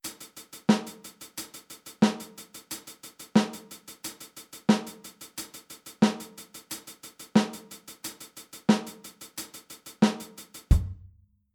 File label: Groove snare; HiHat